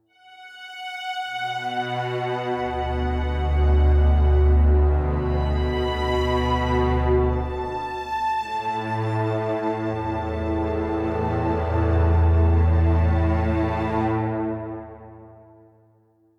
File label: modular story time BG LOOP; The Detail ios linux macos windows gamerip 2014